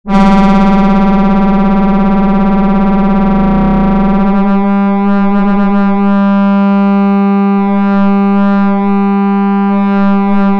lineare FM; Model15

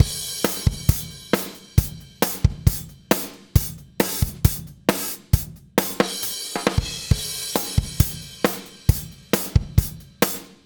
Kompressor Vergleich Softube Tube Tech CL 1B mk2

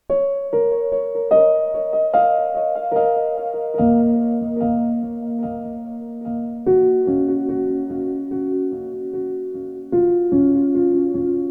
Piano Sample Viertel und punktierte Achtel; Free the Tone Future Factory

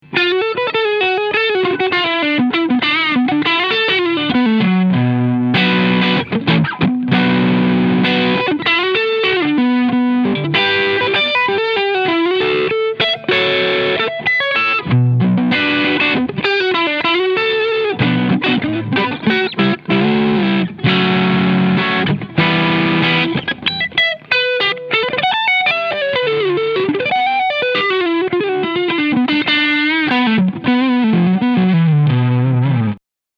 Overdrive beide Schalter oben Tone 1 Uhr Drive Full; Keeley Noble Screamer

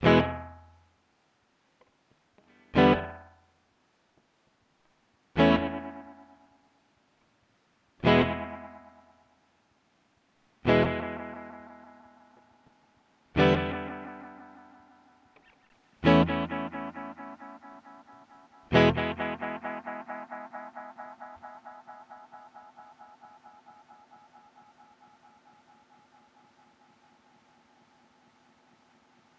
Binson Echorec E Gitarre; T-REX; REC 1 PB 1 4

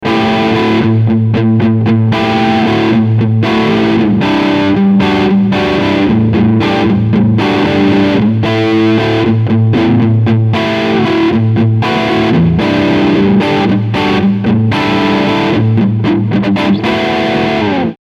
Crunch beide PUs

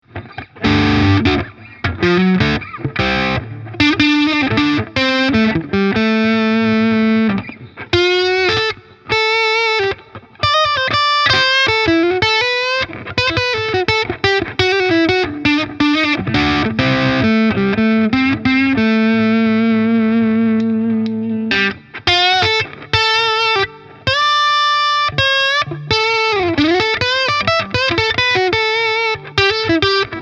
Verzerrt beide Pickups erst HB dann beide SC

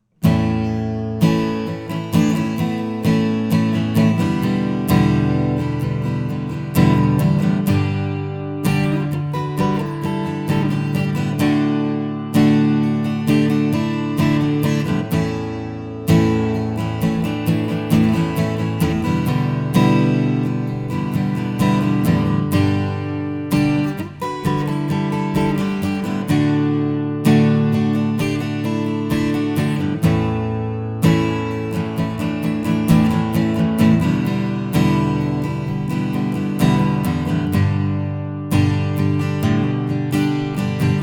se electronics t1 kondensatormikrofon akustikgitarre

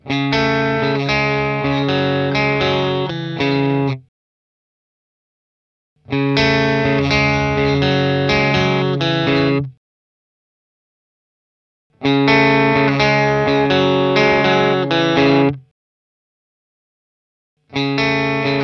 Boss Katana Artist Global EQ und EQ Contour Varianten

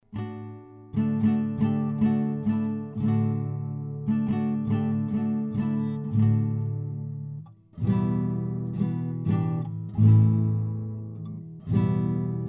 Austrian Audio MiCreator Y Lav Akustikgitarre

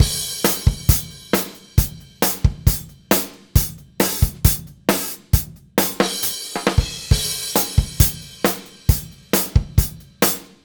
Kompressor Vergleich Original Schlagzeug Beat

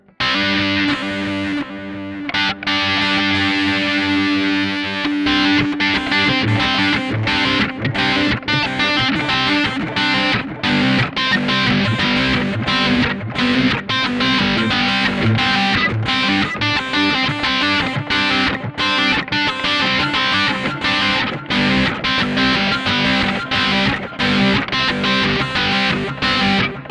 Silos Delay Tape Kontext Dist. Rhythm; EQD

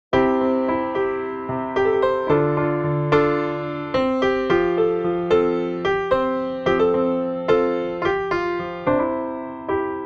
studio piano frei; logic pro 11 session keyboarder demo

